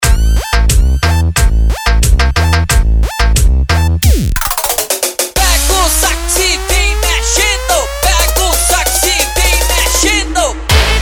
SAX DO PC

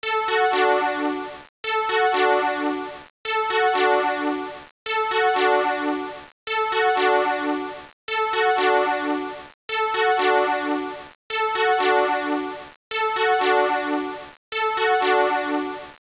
sint Loop

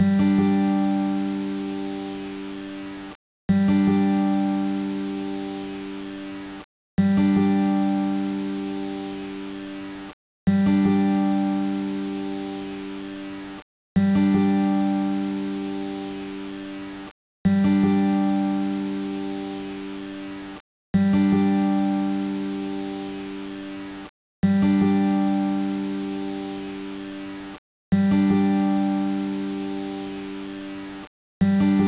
guitarra Loop

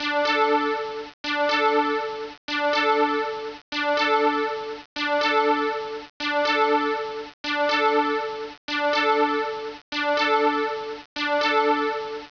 sint Loop

